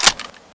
ak100 mag in, weapons; valve

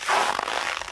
snow; valve

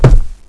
pl wood, player; valve

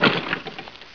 debris generic; valve

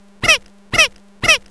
children defeat; valve

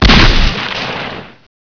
barrel; valve

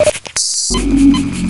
health charger start; valve; items

